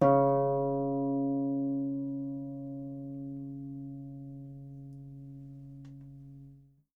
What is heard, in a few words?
Harp C#3; Miroslav Vitous - Percussion & Harp